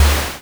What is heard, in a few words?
car hit; vehicles